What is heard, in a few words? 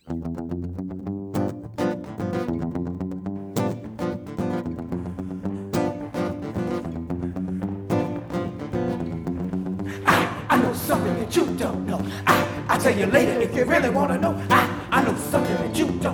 gossip talking blues